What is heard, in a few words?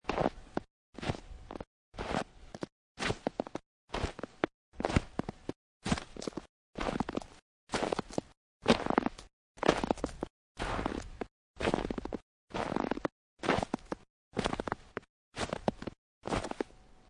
Snow And Ice Footsteps Snow; Weather